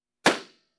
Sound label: Popping balloon